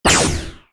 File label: attack; CyberCodeOnline